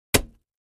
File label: Axe Paneling 1 Side, axe hits